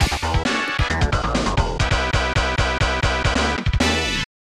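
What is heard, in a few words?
Training Clear; international superstar soccer; snes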